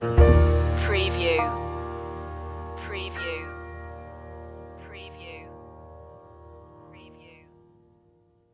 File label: a passing glance stinger